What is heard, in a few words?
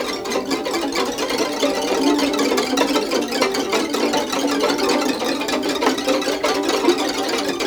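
VLN COL LEGNO, Violin; Q Up Arts; Denny Jaeger Master Violin Library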